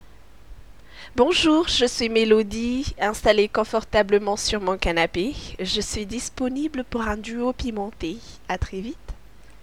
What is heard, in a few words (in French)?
Melodie audio